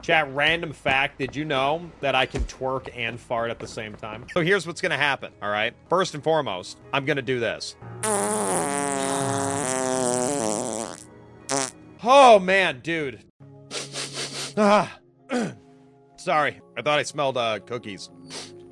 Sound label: corgi twerk and fart